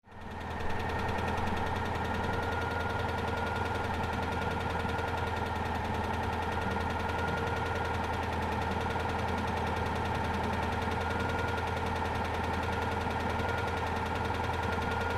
Citroen Deux Chevaux, Idle Medium Cu with Valves Knocking